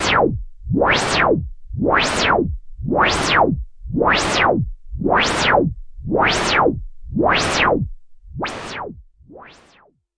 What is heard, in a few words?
noise SH101, Noise Sweeps